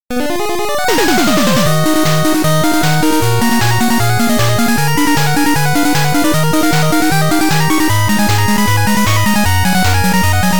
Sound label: 8bit stage; act05 stage02